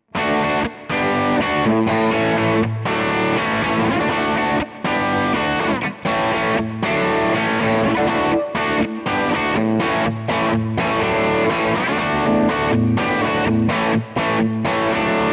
Epiphone Modern Les Paul Bridge crunch

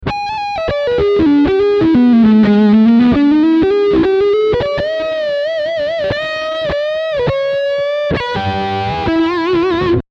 Leadline humbucker front

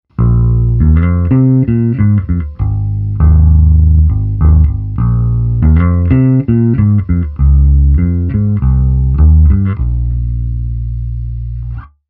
Epiphone A.W. Bass Neck Bridge

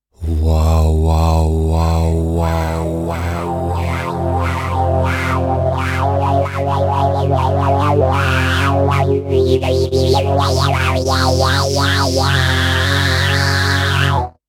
Synth

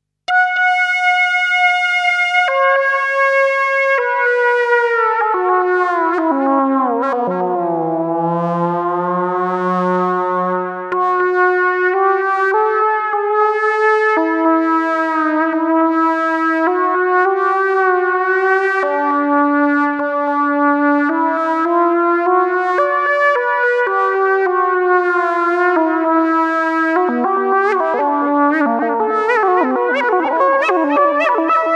Boss CE-1 Roland SH-1

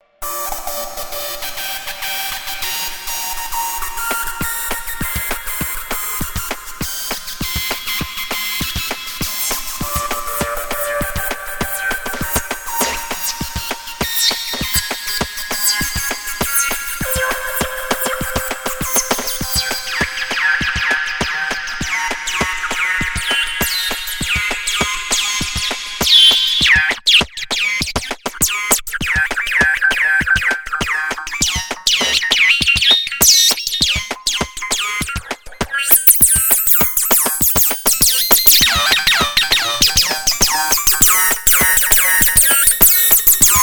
Sawer DnB Flavor

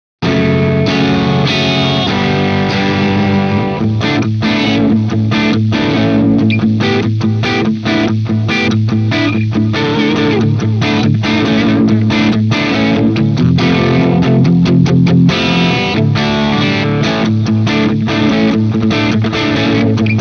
Riff Crunch

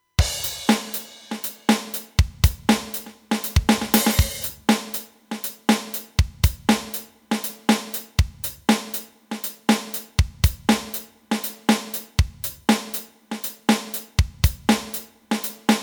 Beat

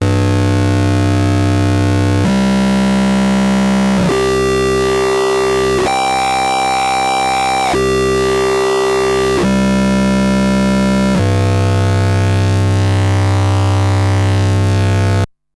Korg MS20 Ringmodulation VCO Pitch

